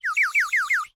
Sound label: Bird whistle, clay, painted, water, airy, wispy, phrase, excited, happy, steady, very short; Eiravaein Works; Vaeyan IV